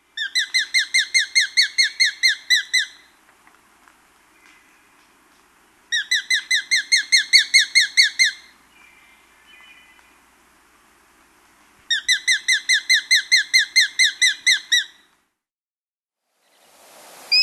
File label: Sharp shinned Hawk